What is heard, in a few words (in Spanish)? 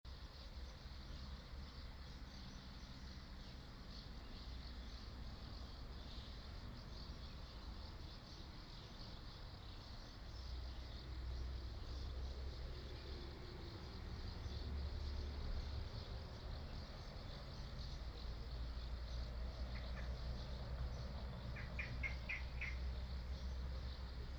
PUENTE DE HIERRO; puente de hierro de coria